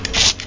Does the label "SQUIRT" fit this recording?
Yes